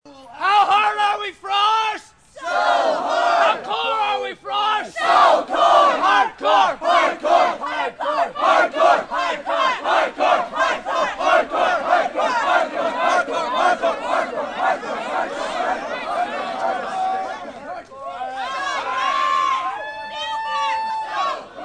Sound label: Hardcore, Crowd